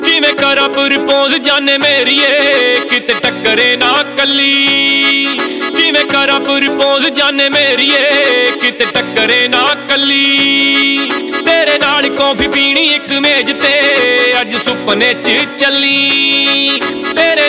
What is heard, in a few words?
Punjabi Ringtone